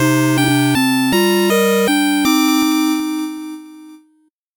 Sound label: 8bit jingle normal